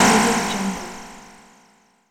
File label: game shot; wildemotion